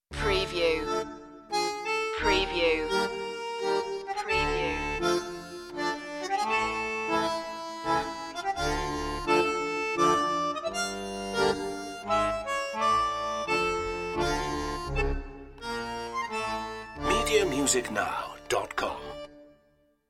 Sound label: solo accordeon only